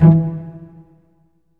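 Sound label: cello pizzicato E2, Virtuoso Strings; ILIO; Kirk Hunter Virtuoso Strings VOL-3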